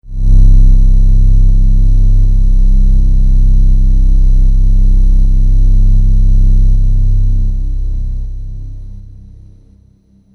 Downtown Overlay Drone

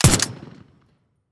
silenced; teardown ps5 windows xbox series xs gamerip 2022

